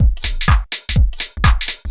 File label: HOUSE BEATS 125